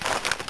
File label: gun move; mw2